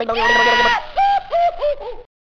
Music; BreakinBriks

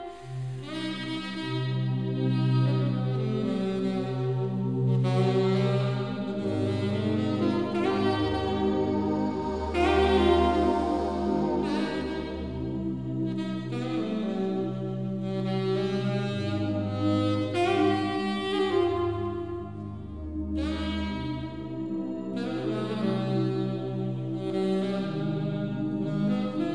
KAZOO; THEATRON

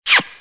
kiss; CHASM

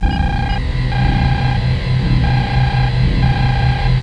noise; Herois

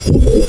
POWER BTN PRESSED SND; Descent 3

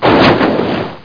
bang; dosgames; brr

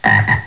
frog; Denkspiele; Diabolica2

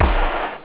clap hit, stabs

